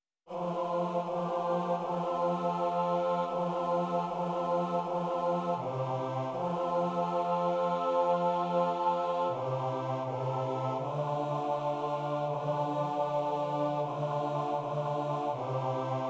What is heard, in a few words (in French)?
Notre pere bas